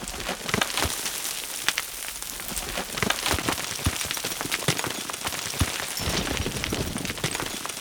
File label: stones small loop